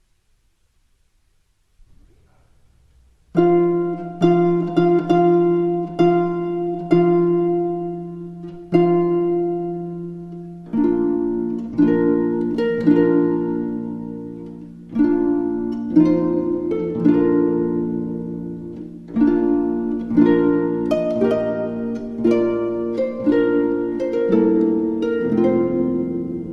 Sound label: Bridal Chorus